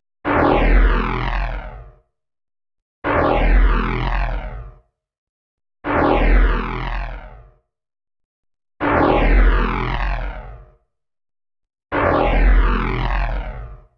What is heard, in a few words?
Kung Fu Zoom In